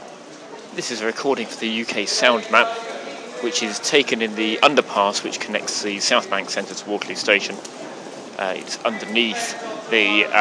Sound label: newspaper vendors in underpass near waterloo station; uksm